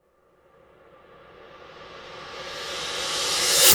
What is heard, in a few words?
IMP CYMBAL, Cinematic Impact